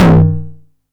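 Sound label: 909 mid tom tape saturation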